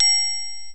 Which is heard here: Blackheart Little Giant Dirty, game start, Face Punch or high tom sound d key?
game start